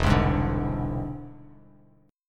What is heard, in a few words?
E Augmented 7th E down Bass Standard